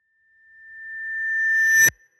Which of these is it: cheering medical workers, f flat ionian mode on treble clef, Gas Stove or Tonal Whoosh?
Tonal Whoosh